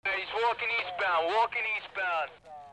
PHONES

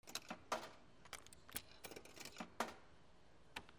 MONEY

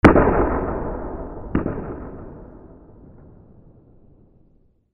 WEAPONS

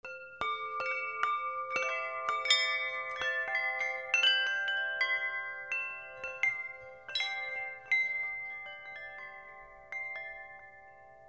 BELLS

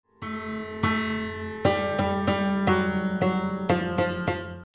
MUSICAL

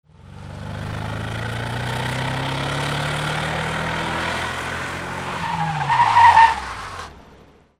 CARS

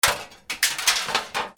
FALLING

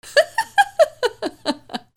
PEOPLE

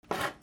KITCHEN

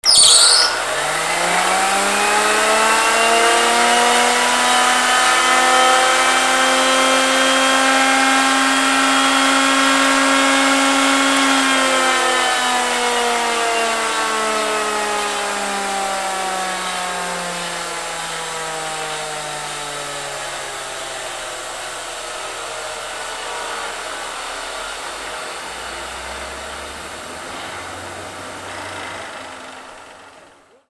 INDUSTRY

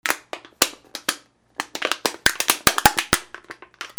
BREAKING

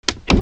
CLICKS

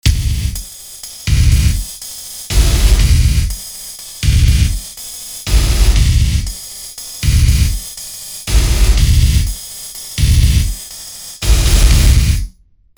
RHYTHMIC

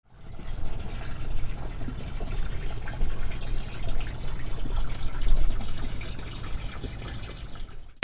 BOATS

